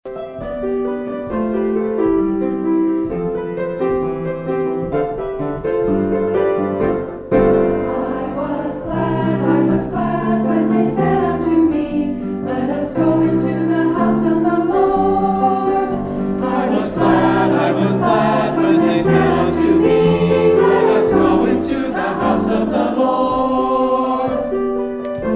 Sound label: Choir